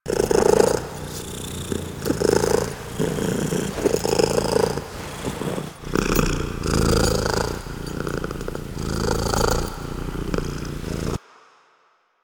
Purr Sleeping Loop; Warframe; Kalymos

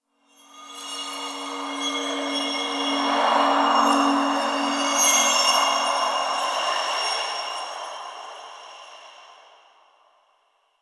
Interior Cave Spirit Gust, Ambience

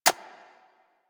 Sentient Brachiolyst Step Default, Steps; Warframe; Sentients

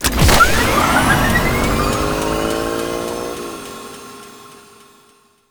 Skiff Engine Boost Medium, Vehicles; Warframe; Grineer Skiff Player Vehicle

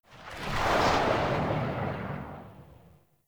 Infested Shotgun Tail; Weapons

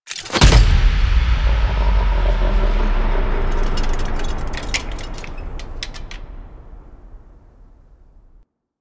Neck Grab; Erra; Dax; Warframe; The New War; Lua War Room

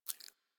Foley Mouth Move; Enemies; Warframe; Catbrow

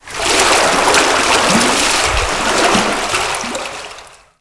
Ocean Water Rising Laps; Warframe; Grineer Ocean

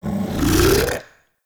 Monster Spit Inhale; Warframe; Infested

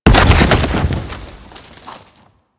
Ice Ring Spike Spawn; Enemies; Abilities; Warframe; Corpus; Arachnoid Camper Terra

